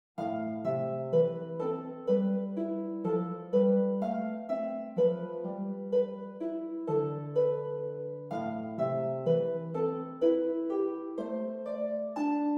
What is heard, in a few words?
horn harp